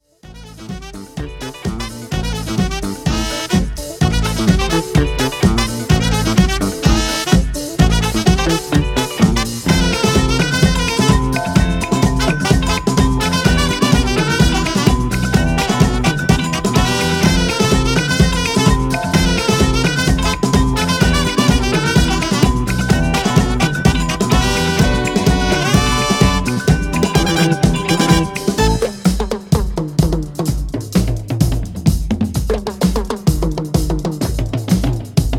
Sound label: Drum